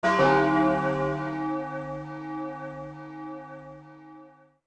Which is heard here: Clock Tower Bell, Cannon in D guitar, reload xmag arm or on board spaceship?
Clock Tower Bell